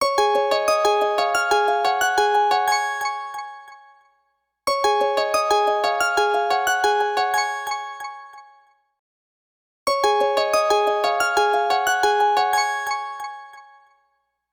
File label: Melody Bell; LG